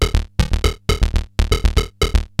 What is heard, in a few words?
SH101 LOOPS; ELEC-LOOPS; Syntec; Wall of Sounds; TECHNO-BOX